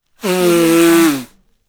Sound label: blowing nose